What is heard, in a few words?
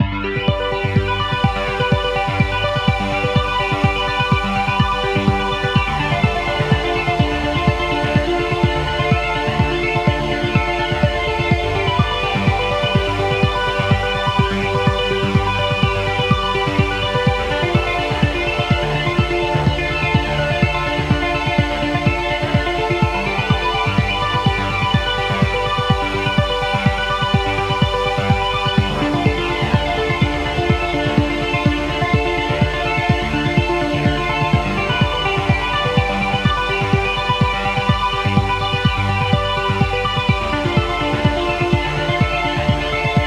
Credits Music